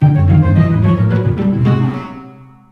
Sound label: Level Failed; Gloomy Manor